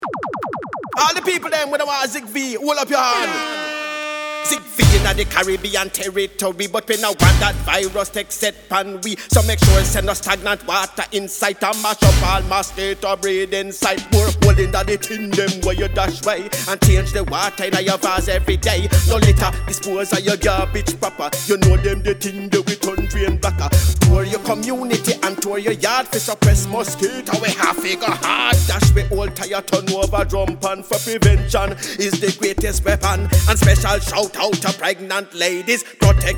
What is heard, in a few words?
Jingle; ZikV Jingle; Zika Virus